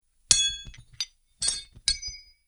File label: Metal Chain Sounds; Metal Sound Effects; Chains Sound Effect - Clink, Clatter, Clang, And Clink Are The Sounds That These Chains Make.